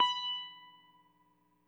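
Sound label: electric sitar soft; Quantum Leap - Guitar & Bass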